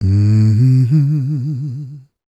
A MMM, Vocal Blues Soul; Spectrasonics; Planet

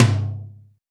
TOM, Drums; Best Service; Real Mega Drums VOL-1

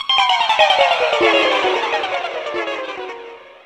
GTR PICKI; AMG - Now CD-ROM (Roland); B.2.B. Fun

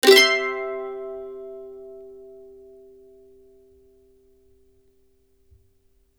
CEE CHORDS C, Blues Guitar

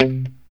POP C 3, STRAT guitar; Spectrasonics; Hans Zimmer Guitars VOL-1; JC-STRAT POP

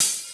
Hats and cyms, Funky Drums, Kit hits; Neil Conte's Funky Drums From Hell (1992)

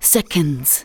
vox, Techno Trance; Ueberschall

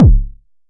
Kick, Drumset; Roland SH-32

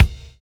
LIKE 808

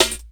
SD, Drum & Bass, 150 BPM

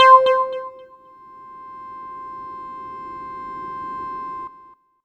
C5, SynthLine

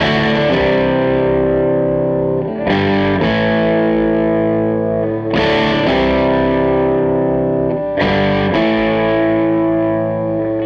Les Guitar Loop

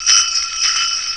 JINGBELL; CMI Sound Library Rev 1.3

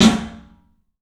club snare, 3D Drums; 3D Sound

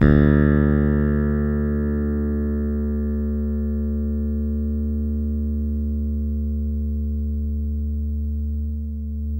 Deep bass D 3; Spectrasonics; Bass Legends; DEEP-SIX

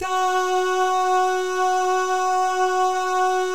DAH, Vocal Jazz; ILIO

